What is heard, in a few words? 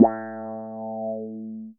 GUI MULT; EastWest; Dance Mega Hip Hop Electro